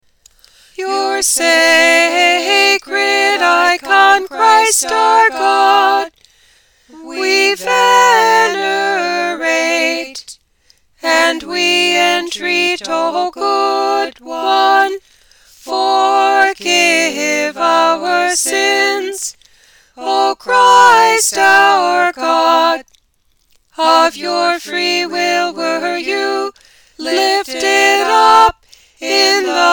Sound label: soprano emphasis